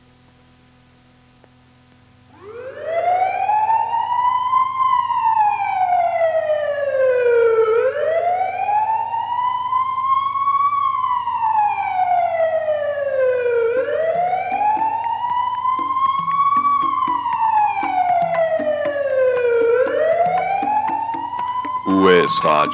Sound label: us radio jingle; ugensgæst